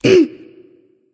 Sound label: nv clear throat; smite; voicepack; ratatoskr